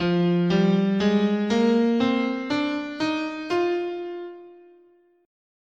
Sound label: F Dorian, scale